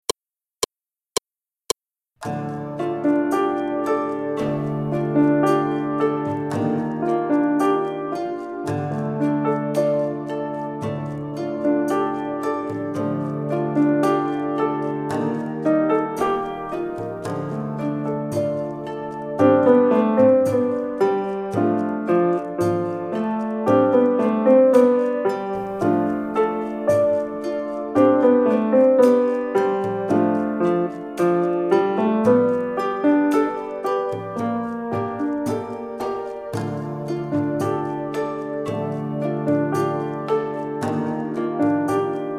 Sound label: Piano